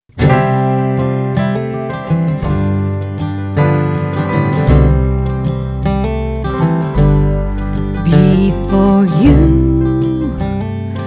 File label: Blue Waltz Mono